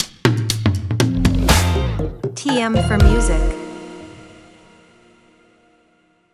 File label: audio logo; sound ID; sonic branding